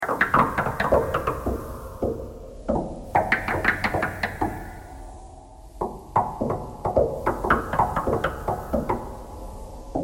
knocking thread noise all

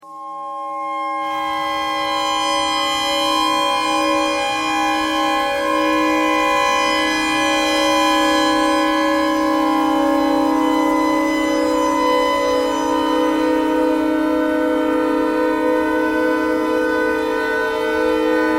tornado siren clarinet notes e thread noise

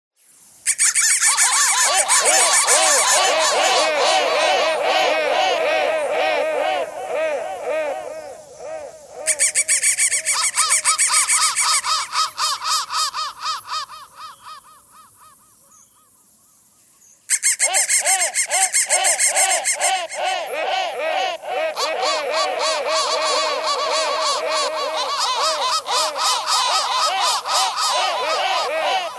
crow crow thread noise

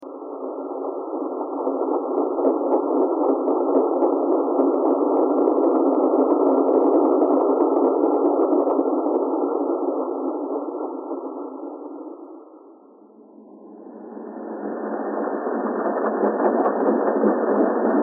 thread noise

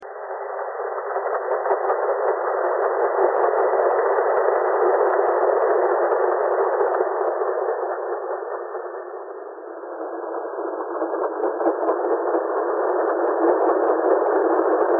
thread buzz

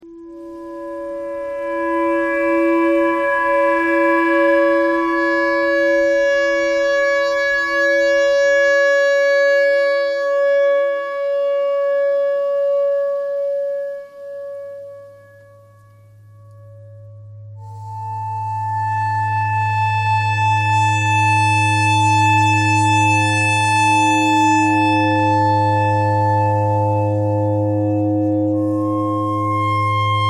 clarinet notes e clarinet thread noise

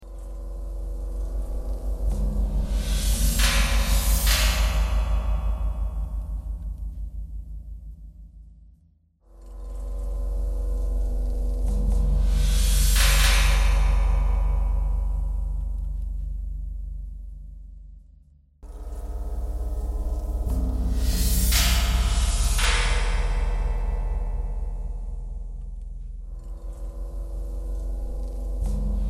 knocking thread noise

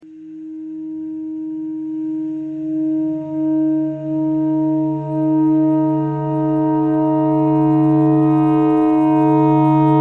clarinet clarinet notes e noise

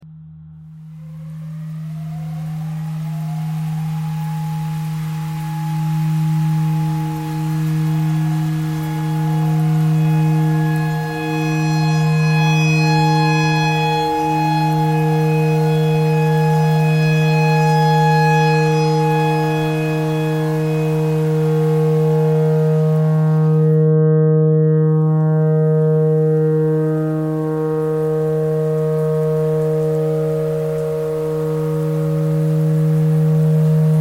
tornado siren clarinet notes e thread simple

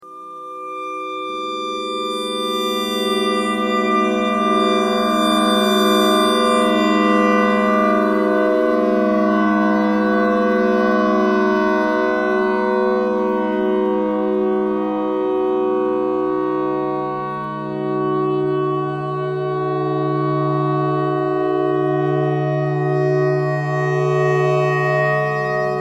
clarinet clarinet notes e thread noise

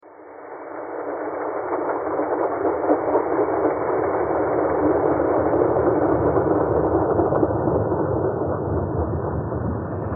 thread harmonic

